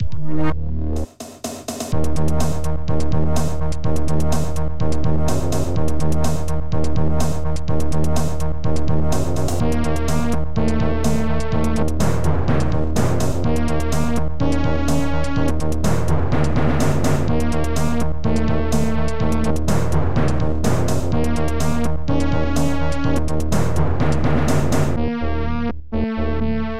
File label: Battle Theme; Horde; Maelcum